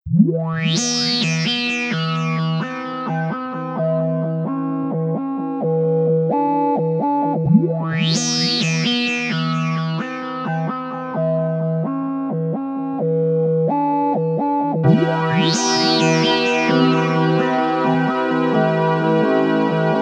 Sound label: techno trip 432 hz